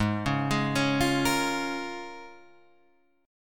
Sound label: A sharp Minor 7th, G sharp